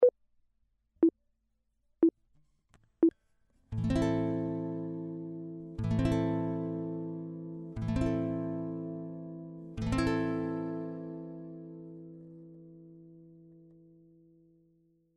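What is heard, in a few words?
chord, guitar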